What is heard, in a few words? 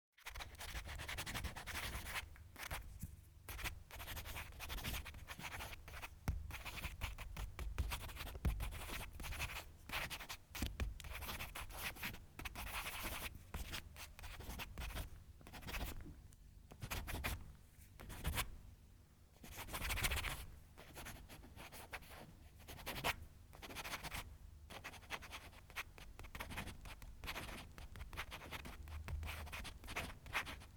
Pen Or Marker Writing On Paper Close Up